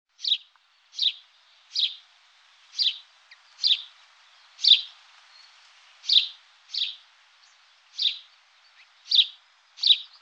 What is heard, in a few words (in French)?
Moineau domestique; Le cri du moineau